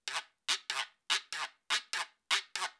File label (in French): Guiro ternaire shuffle